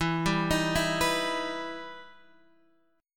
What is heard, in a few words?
E Minor Major 7th E down Guitar Drop D